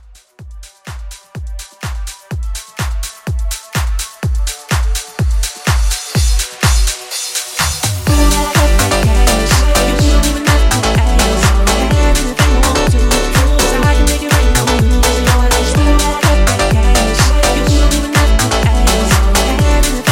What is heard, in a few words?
Club House Reboot